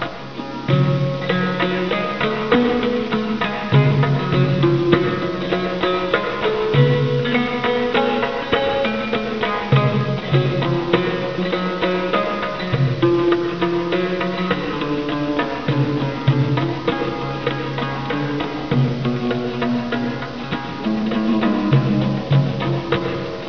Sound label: radio music